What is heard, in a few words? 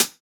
Closed Hat; Ghosthack Advent Calendar 2017 Day 5